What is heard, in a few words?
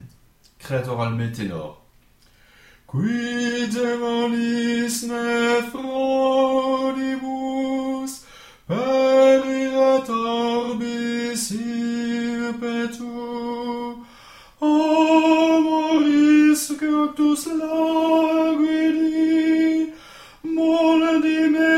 tenor; Creator alme